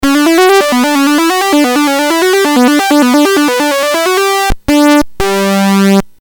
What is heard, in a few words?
BASIC sid CSG8580R5, GAMES; Terrible Twins